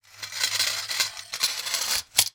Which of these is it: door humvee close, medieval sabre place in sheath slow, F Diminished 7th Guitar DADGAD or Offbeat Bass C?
medieval sabre place in sheath slow